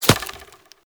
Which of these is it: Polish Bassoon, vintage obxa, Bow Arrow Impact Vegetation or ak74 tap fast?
Bow Arrow Impact Vegetation